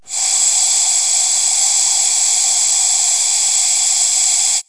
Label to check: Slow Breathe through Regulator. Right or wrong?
Right